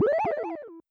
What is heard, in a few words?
Arpeggio; Inkopolis News; Splatfest